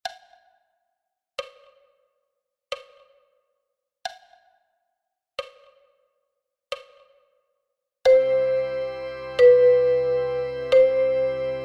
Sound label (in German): Choral Ukulele und Bariotn Sound ohne; Bach